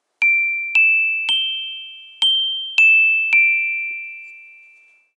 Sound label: Rubber Club